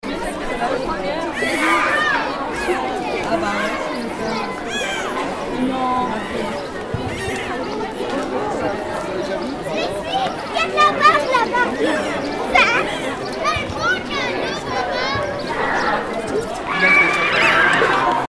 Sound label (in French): fête de l'école